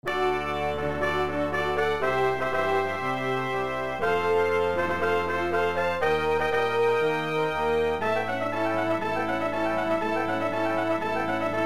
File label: Brass Quintet; JOY